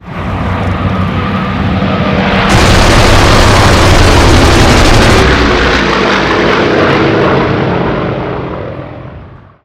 gun pass

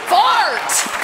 fart woman; steve harvey

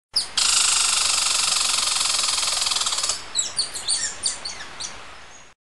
squirrel

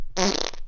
fart

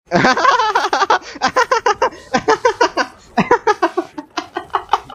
rapahhahhhaaaha

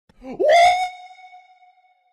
wooooooooo; cr1tikal

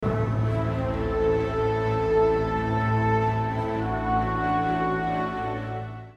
alert

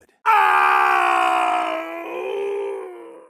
scream; mk9; johnny cage

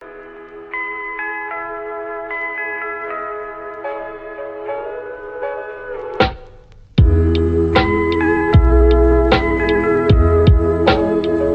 lofi

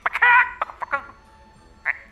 chicken

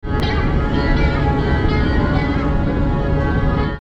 cylon motif

